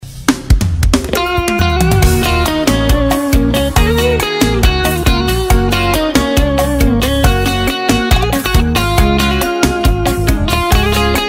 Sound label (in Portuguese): sertanejo e pisadinha; cd volks sound car